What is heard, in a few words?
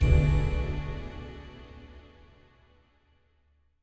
spin button quick stop; fairyred